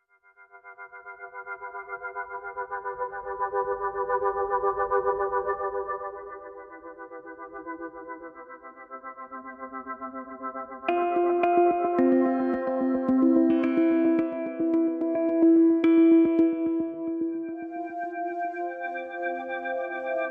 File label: Rhythmical Urban